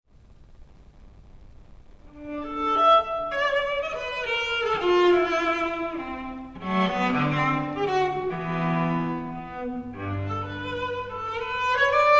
Sonata for Solo Cello Tema pastorale con variazioni LIVE; Crumb; Mimi Furuya Cello